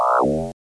Forcefield

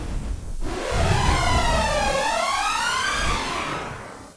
Glitch

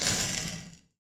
Spell fire

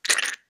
Spit

